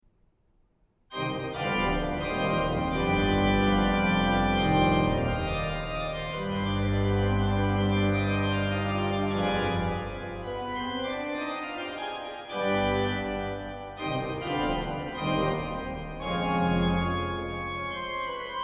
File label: Toccata